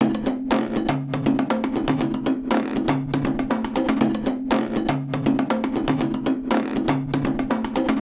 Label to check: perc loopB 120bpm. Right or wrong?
Right